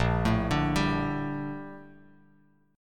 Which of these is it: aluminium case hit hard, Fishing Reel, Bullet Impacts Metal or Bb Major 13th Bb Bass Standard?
Bb Major 13th Bb Bass Standard